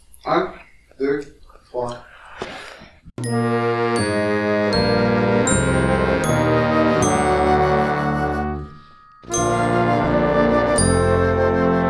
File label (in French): du chalumeau au clairon il faut respecter la distanciation noire a 80